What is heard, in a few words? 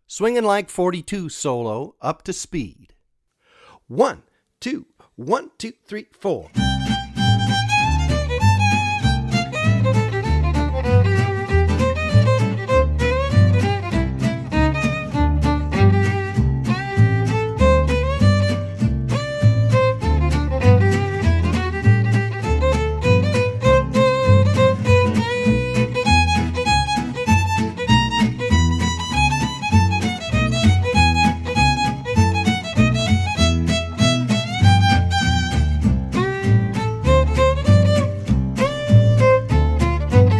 Swingin' Like 42 Solo Regular, swing jazz; Swing Jazz Violin with Hot-Club Rhythm